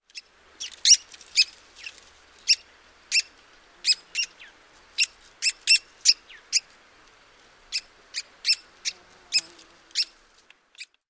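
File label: more calls, bird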